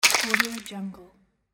slime gun splatter

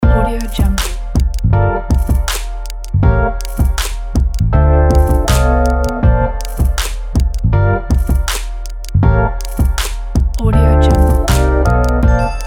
rhodes rnb loop

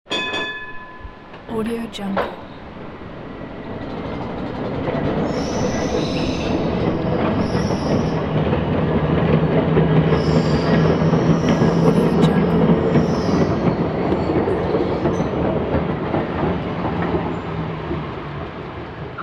vintage electric tram passing

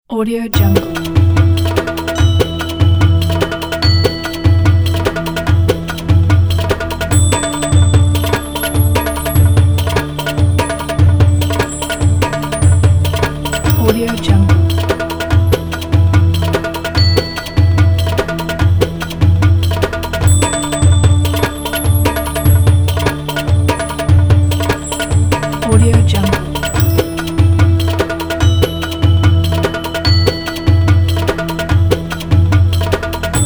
belly dance

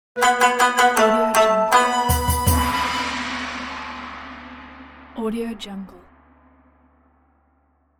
traditional asian logo; applehillstudios

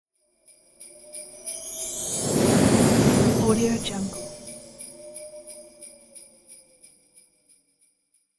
santas sleigh

